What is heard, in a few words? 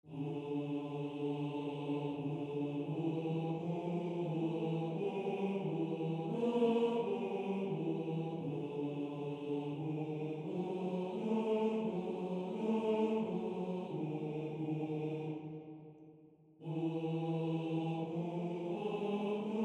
Bass